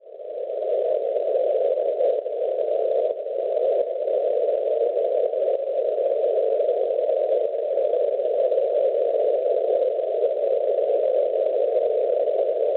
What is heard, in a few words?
NDB, 258 kHz; Ostrava; Repubblica Ceca; Schwarzsee